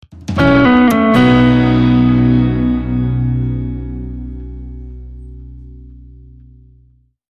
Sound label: Two Notes Dreamy